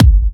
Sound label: Kicks; Vengeance Samples